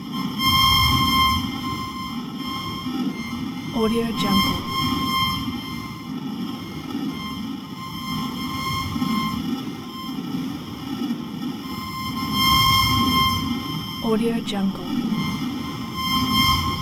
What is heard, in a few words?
hollow shimmer glitch